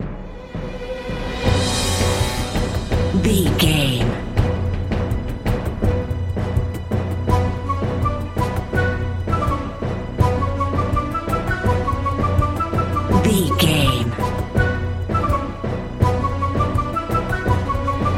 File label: battle theme